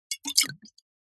food drink alcohol spirit bottle tilt movement splosh recorded over open top